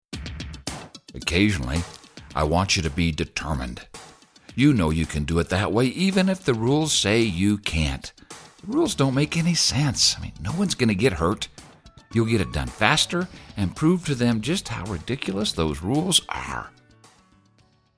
music

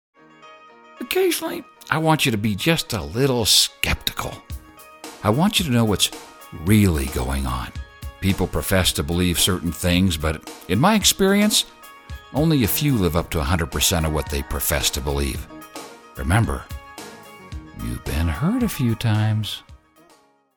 music

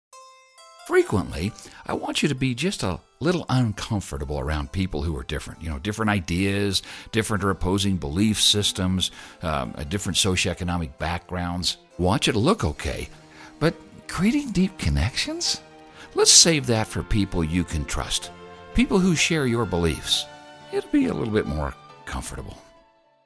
music

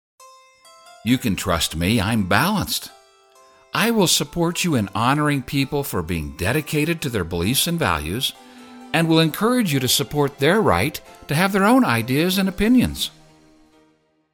music; Accepting balanced

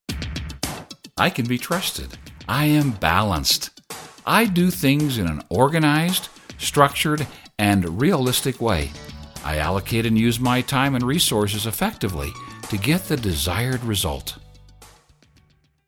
music; Organized balanced

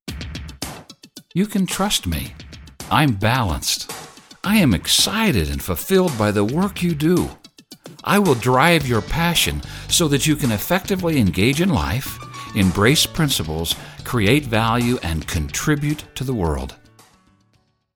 music; Passionate balanced

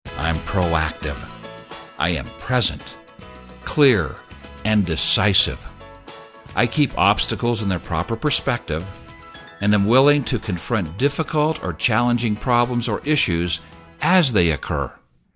Proactive music

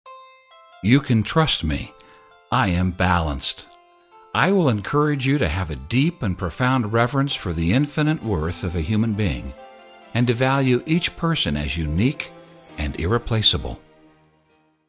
music; Empathetic balanced

